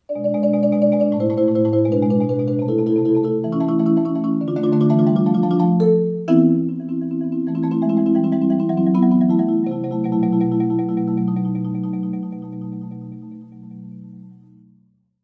interlude solo excerpt

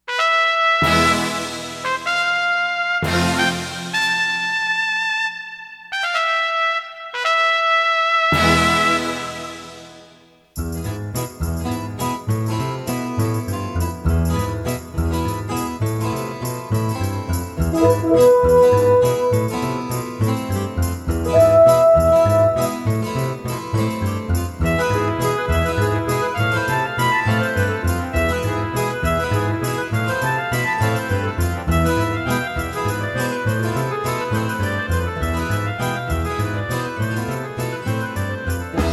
orch

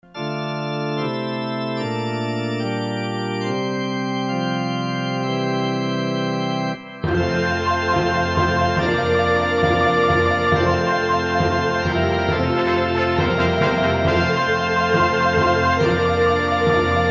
grand march orch excerpt

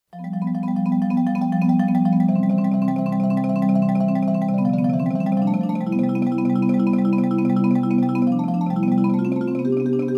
solo

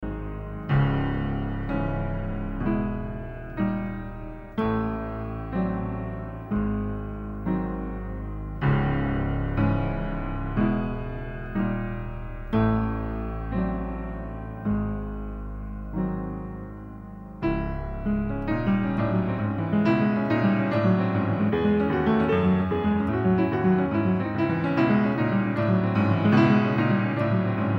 c sharp minor prelude

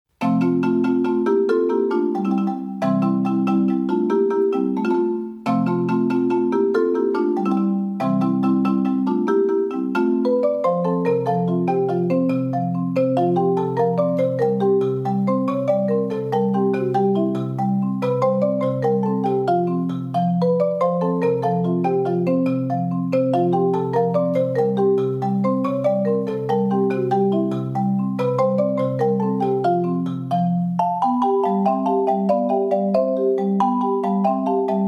fiesta solo

